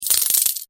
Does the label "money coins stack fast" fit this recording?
Yes